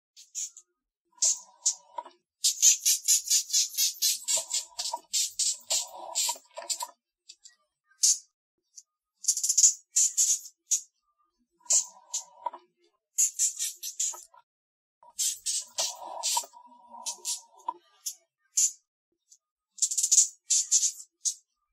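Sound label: Moth, insect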